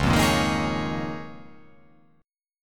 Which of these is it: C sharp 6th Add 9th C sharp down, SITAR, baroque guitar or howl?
C sharp 6th Add 9th C sharp down